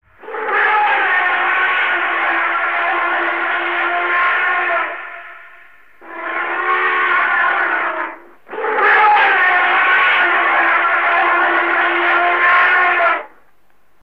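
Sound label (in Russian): дикий слон слон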